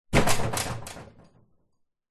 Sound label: household shelving rack loose objects metal shake rattle wobble short; zapsplat